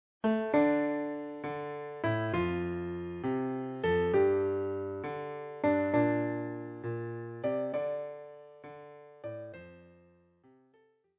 The House of the Rising Sun, Klavier

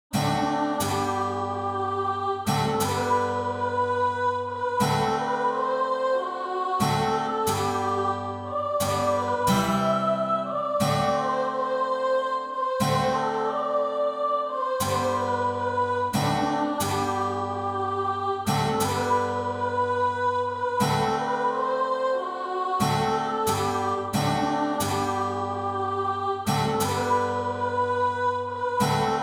O Tannenbaum

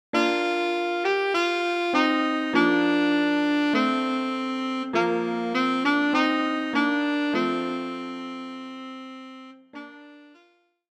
Altsaxophon; für Altsaxophon und Klavier, Schwierigkeitsgrad Klavier Level 2, leicht

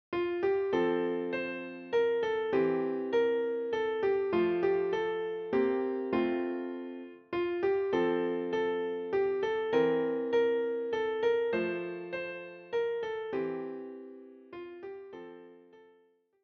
Was soll das bedeuten Klav, Klavier; deutsches Weihnachtslied für Klavier solo, mit Vorspiel, inklusive Fingersätze

